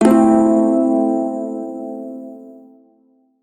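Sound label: Prime Shawzin Pentatonic Major Chord, Music; Warframe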